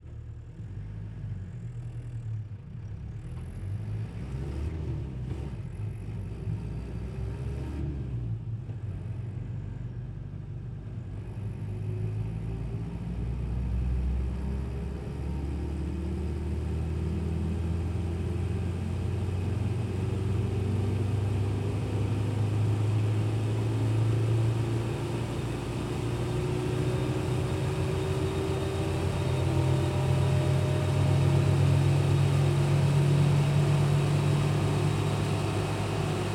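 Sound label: peugeot p4 onboard drive ramps long single stop exhaust; RE20; Pole Position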